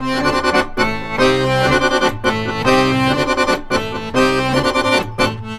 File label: ACC, Balkanish Traditions